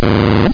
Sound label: MOTOR; Simon; AMOS